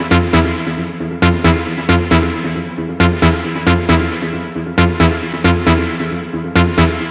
Synth Loop F 135, Techno; Ghosthack; AC2024 - Day 1 - Techno Sounds 2025

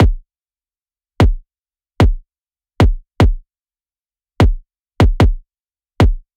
Future Bass Kit 150bpm Kick; Drums; EDM Drums; Drum Kits; Stems; Ghosthack; Ultimate EDM Drums; Drum Mega Bundle; Future Bass Kit 17

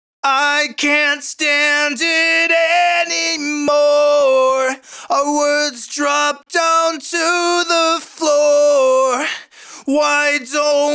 Heavy Vocal Main Vocal Dry, Amin 105bpm; Heavy Vocals 5

